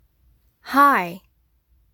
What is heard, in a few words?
sound high